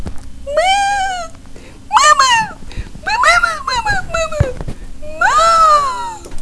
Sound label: high pitch moo moo talk; hall of moos